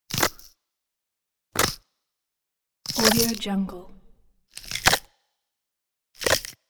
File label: primitive man vine shoots; prosoundfx